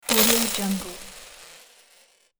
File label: foley coins spill on wood; domestic sounds